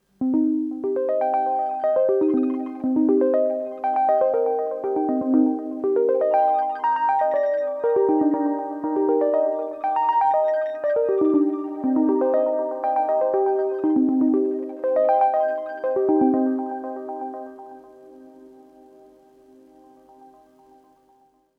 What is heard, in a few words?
Arturia Mini V4 Quantum Room Simulator Taj Mahal; VSL MIRPro3D